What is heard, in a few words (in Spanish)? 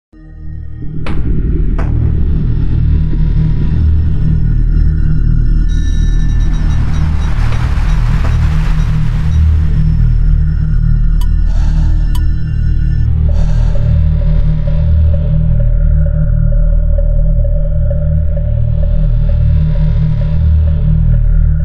musica de miedo horror music efectos de sonido